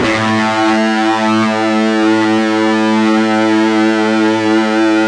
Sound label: fuzz A1